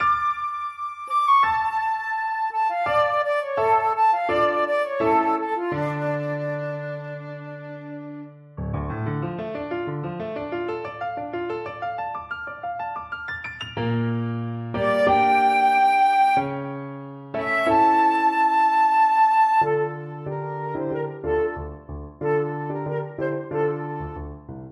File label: piano flute